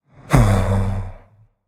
sigh

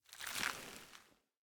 armor; smite; ganesha

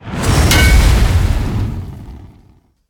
pedestal close; smite

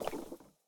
footstep; smite; hera

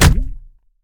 hit trunk; smite; god ganesha skin11

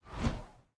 axe swish; smite; chaac

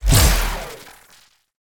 tether activate; smite; osiris

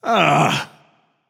ugh; smite; apollo

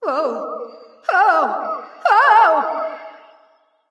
fear light; smite; voicepack; hel

